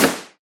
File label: bullet impact wood